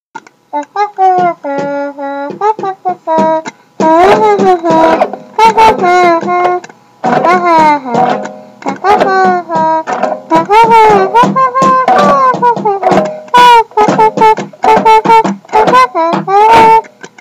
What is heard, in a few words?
jingle bells